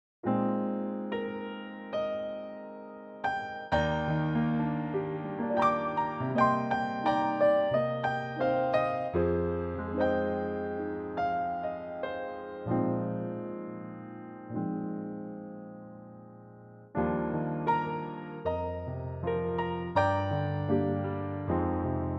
piano; Pianotrak; key Eb - vocal range - Bb to Eb; Beautiful ballad

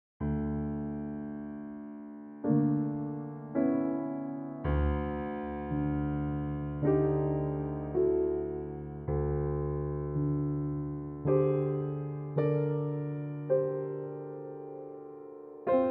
C piano; Pianotrak; key - C - vocal range - F to B

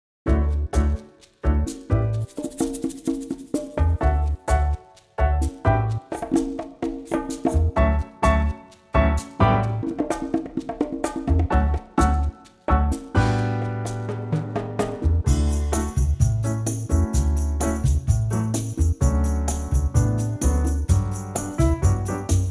D bossa; key - D - vocal range - A to A

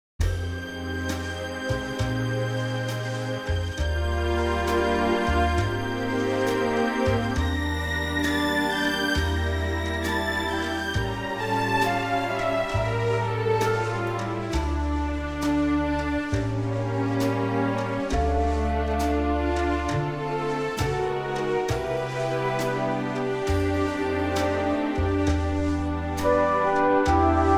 orchestral C

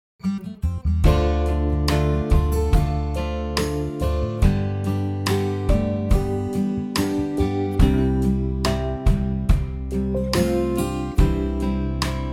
C acoustic

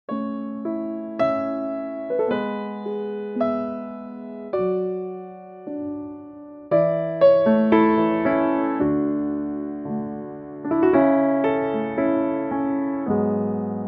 A piano

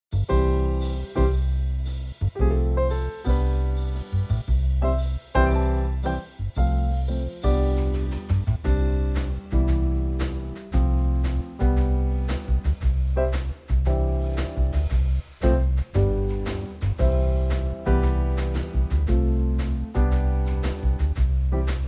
C Db Trio

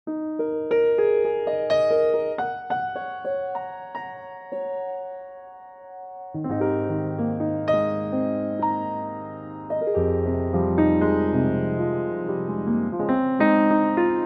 Ab piano

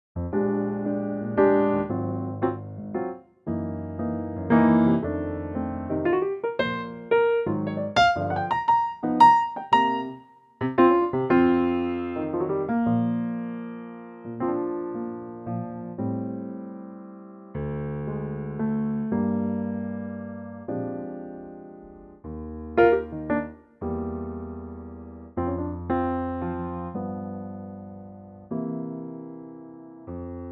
Bb piano

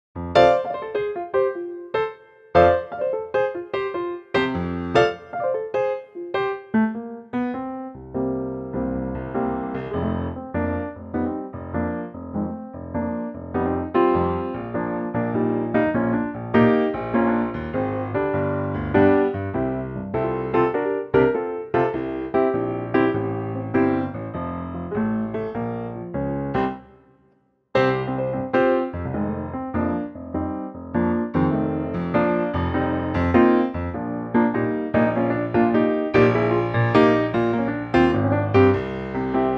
medley F Ab Piano

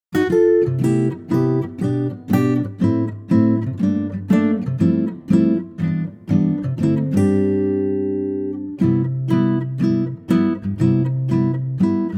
C to Db acoustic guitar

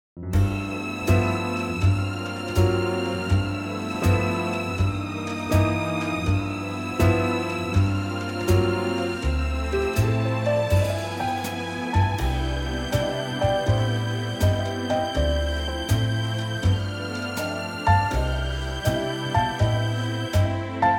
no saxes C; vocal range - A to A

